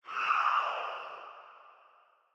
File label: soulspeed; minecraft; enchant